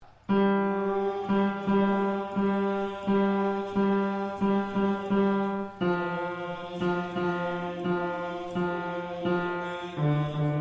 Bass

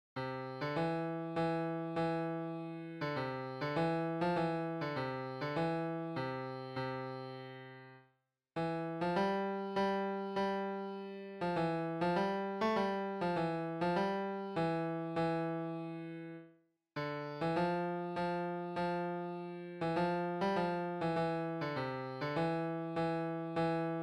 Bass Midi Pno

